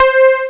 piano el mod; Amiga Special - Software Hits; orchestra; keystring

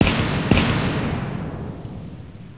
battle; batword

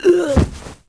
f DIE; urbanchaos

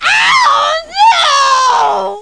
kill; POWERdrive for you 1998 January

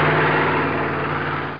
CAR LEAVE

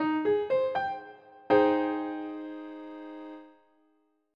AB MAJ7

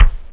wood kick

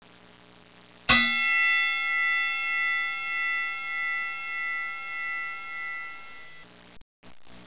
triangle

